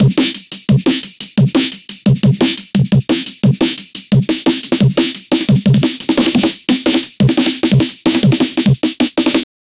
a drum pattern like an, drum and bass

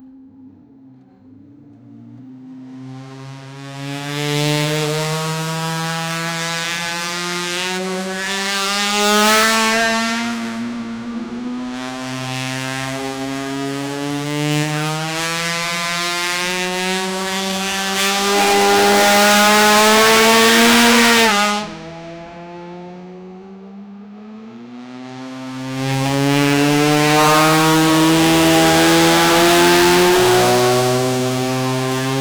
a race motorbike with v4

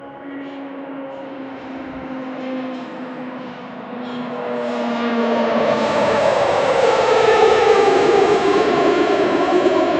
sound effect of airport

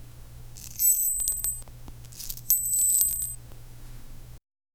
soft metallic clink; velvet

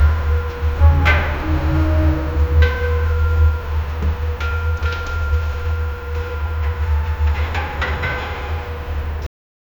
sub bass vertical sound; metal creaks

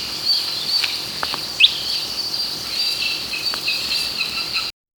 gentle jungle ambiance, bird calls

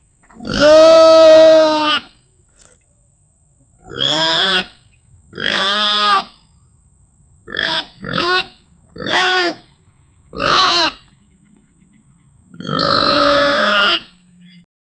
bear growls and pig screa

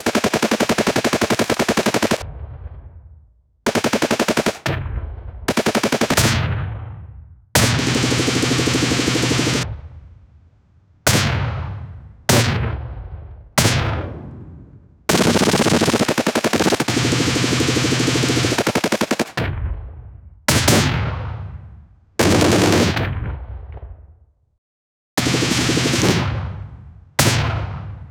distant realistic semi automatic, intervals

